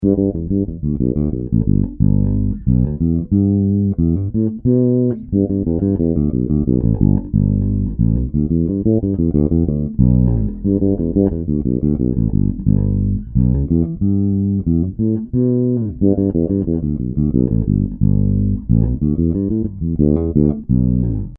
defret

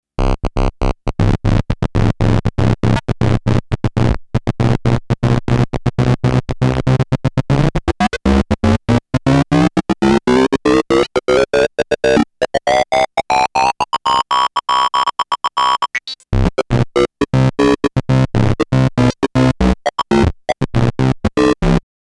Korg EA-1 OscMod Ring

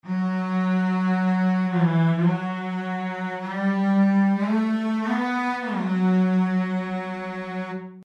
Cello portamento

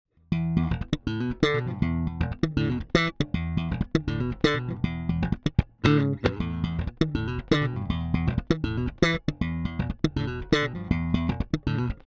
Both Passive Slap

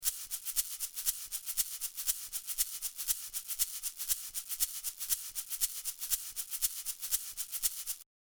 Duet Shaker

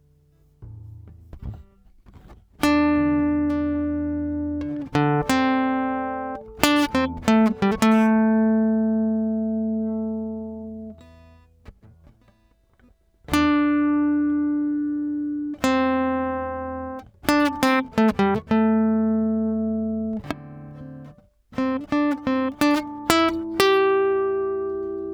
Guitar Raw

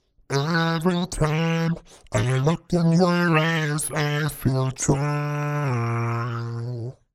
Vocals Screwed

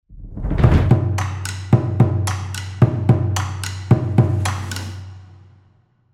Jaeger Percussions

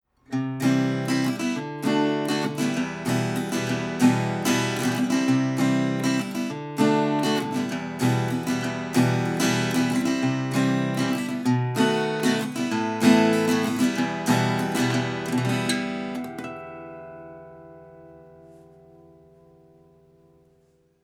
Framus Parlor Model FP-14SV VSNT Flatpicking

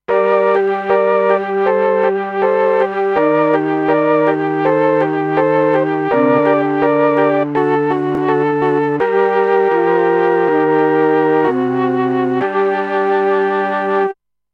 Mello Sample Flute

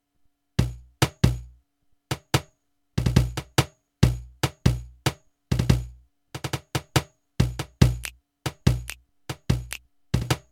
Millenium NonaPad Cajon Kit2

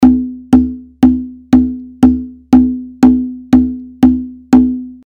Conga Set Close

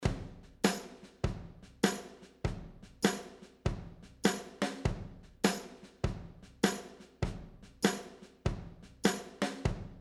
60s drums clean

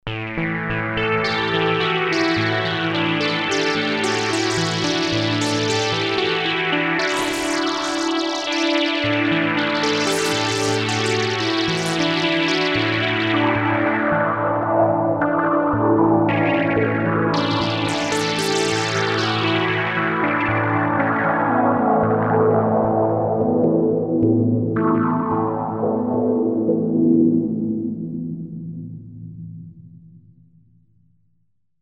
pad

